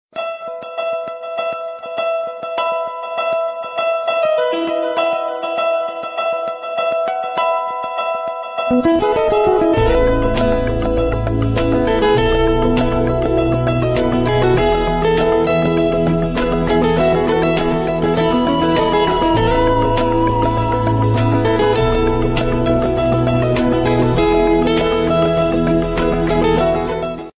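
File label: romantic sms bells and guitar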